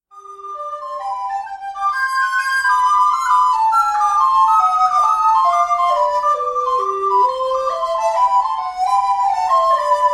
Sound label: Duos for Recorder